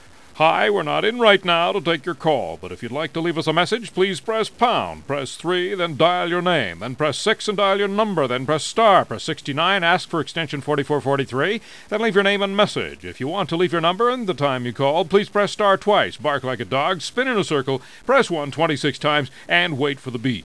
confused

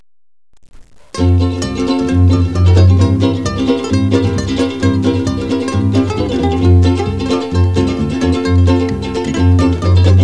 muzak, Ukulele Orchestra

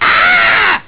Scream; AARRRGGGHHH; Beavis Butthead

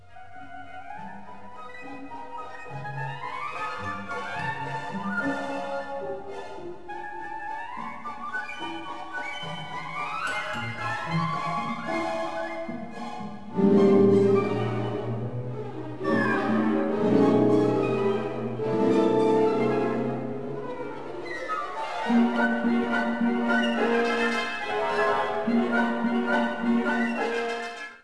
firebird

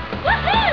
woohoo

